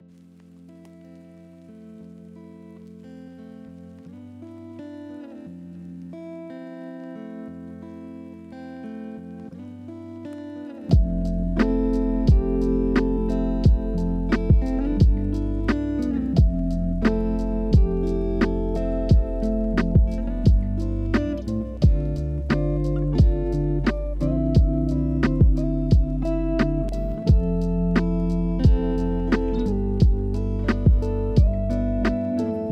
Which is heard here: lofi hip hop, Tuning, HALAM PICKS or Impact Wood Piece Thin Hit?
lofi hip hop